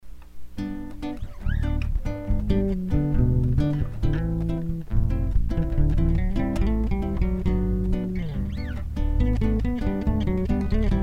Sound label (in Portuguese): bass sambaixando